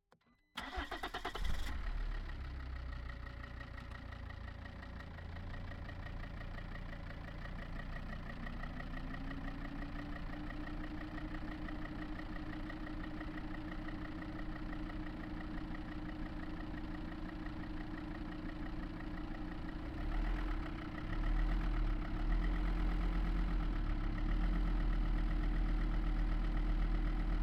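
Volvo V70 Ambulance - ONBOARD - STEADY RPMS - Start Drive - ENGINE - Left; Volvo V70 2013; MKH8020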